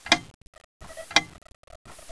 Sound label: clock; windhill